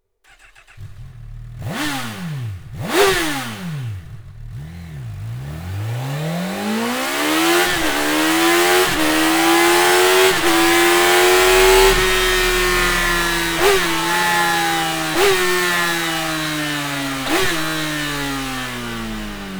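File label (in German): Sound Serie, Auspuffanlage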